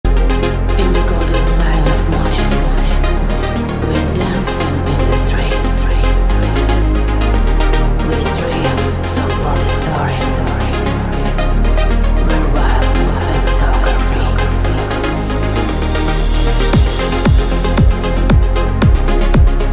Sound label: dance